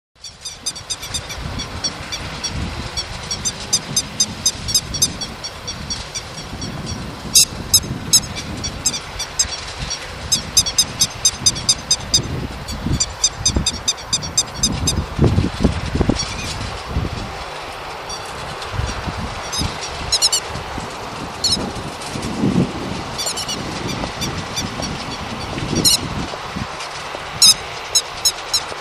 keri 1, birds

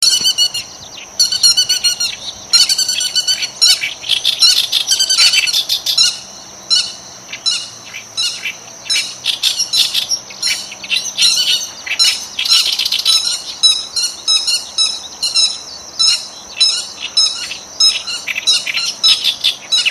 keri & yosikiri, birds

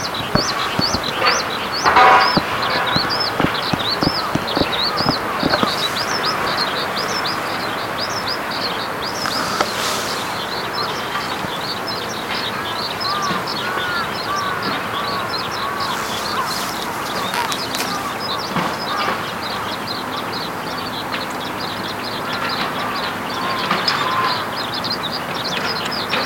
hibari

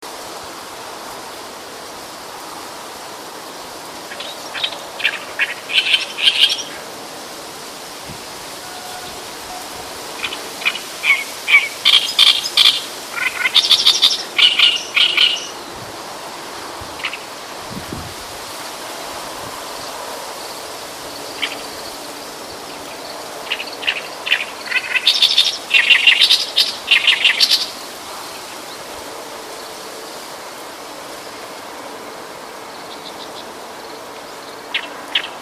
yosikiri, birds

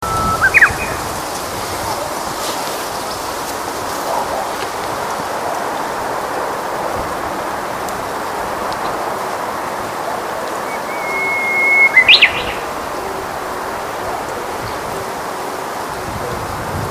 uguisu

